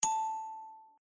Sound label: correct